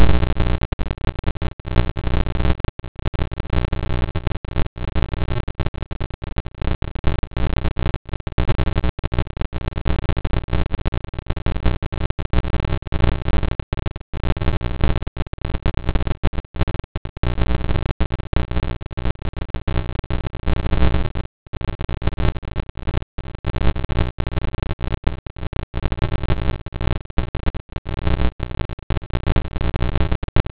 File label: Pulsar Observation; Skynet; B1933+16; pulsar sound; 20m